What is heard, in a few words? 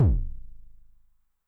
BD MOD [Pitch+Depth+Overdrive], 909mod, BASS DRUM; classic machines